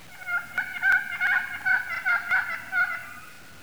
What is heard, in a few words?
plain yelp, Turkey Sounds